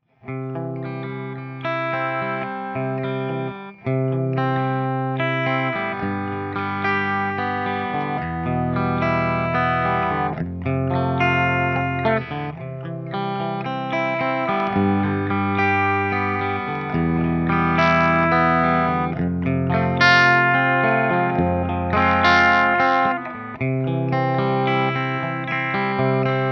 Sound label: TRIWATT 12AT7 PRS Humbucker Bridge Greenbacks; trinity; Phase Inverter Tube - 12AT7